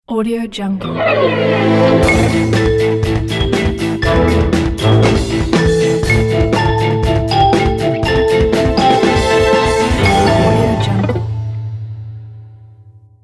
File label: news tv theme